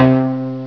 Guitar; HyperComposer DEMO

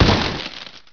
wood, Prangs

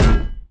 soft Coll; Action; ThinkTanks

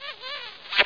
Kiss

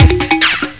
jung beat; Epic Collection 3, The; Epic Marketing; muzak

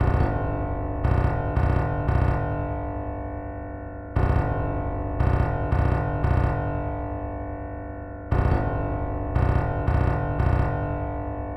MARCH

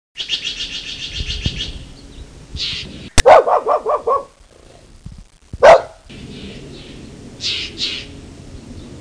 bird calls

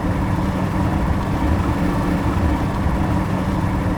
Tank water Run; 1944 Battle Of The Bulge

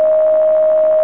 squeal; M4 7.5.2; M4™ (PowerMac)

